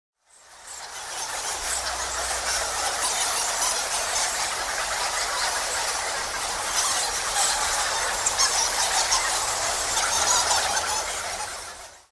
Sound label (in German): Fledermaeuse Hoehle; friedrich fledermaus hoerspiele vogelstimmen fledermaeuse